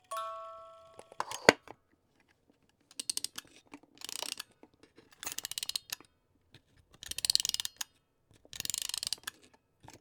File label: Mech Music Box Handling